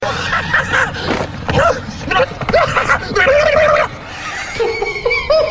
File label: laugh; Joker